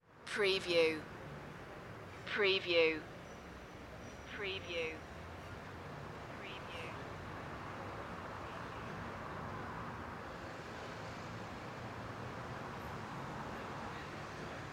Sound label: city traffic ambience